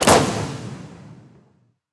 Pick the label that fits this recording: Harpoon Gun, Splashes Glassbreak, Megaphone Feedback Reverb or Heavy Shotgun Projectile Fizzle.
Harpoon Gun